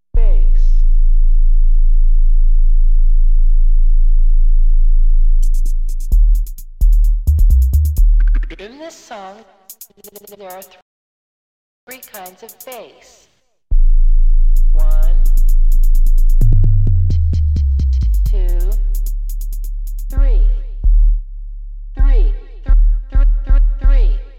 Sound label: Speakers Test